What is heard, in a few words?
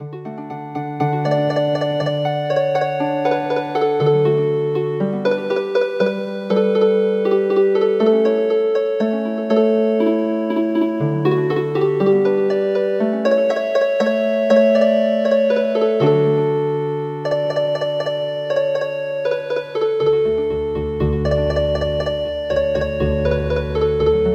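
Healing Harp, midi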